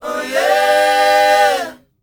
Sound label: O YEAH, S. AFRICAN, World Voices